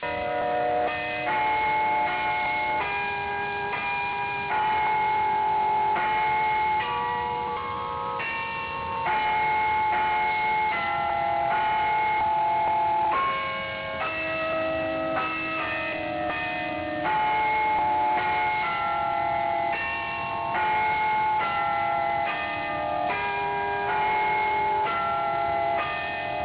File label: boston chime, bell recordings